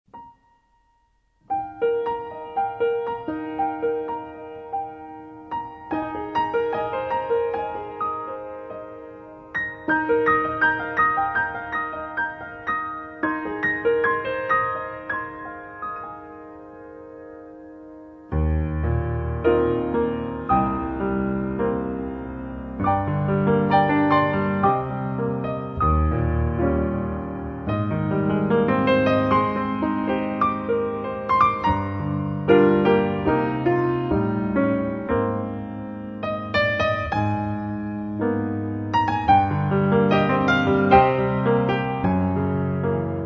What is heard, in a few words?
solo piano